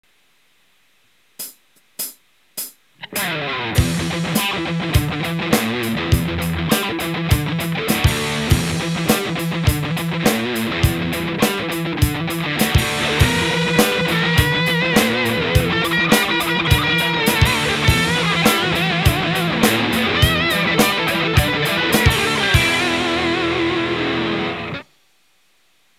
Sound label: drums; fratz